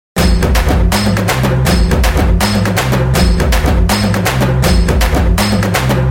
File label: best indian